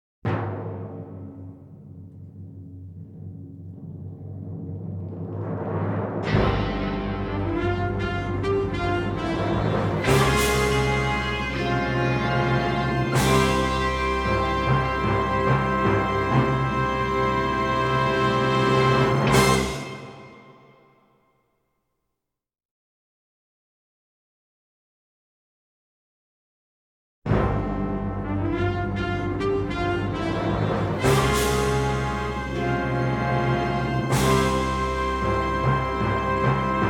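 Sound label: Fanfare - Instrumental; Network Production Music 092 Above and Beyond (Multimedia)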